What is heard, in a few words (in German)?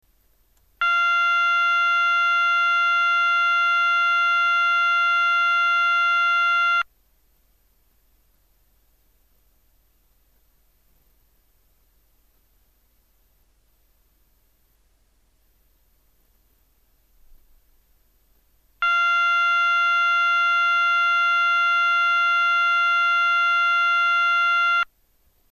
ton, Signalgeraete